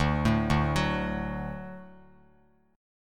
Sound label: D Major D down Bass Standard; D arpeggiated; JGuitar